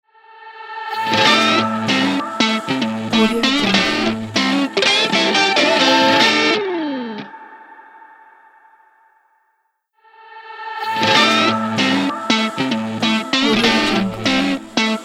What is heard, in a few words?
the stylish rock logo